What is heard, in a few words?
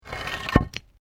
PAVING STONE MOVE, dragging; Objects